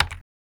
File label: typing